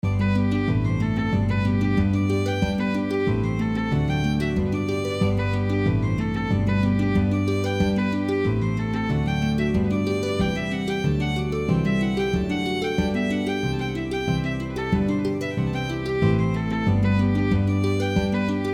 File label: Drum House